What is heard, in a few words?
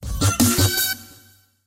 Mech; DVA